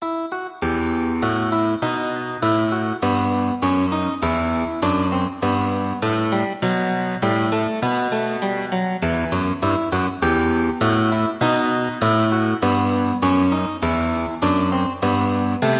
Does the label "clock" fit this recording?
No